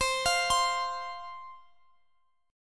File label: F 5th C, chord